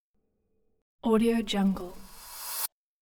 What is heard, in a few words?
cymbal whoosh approaching; hollywoodedge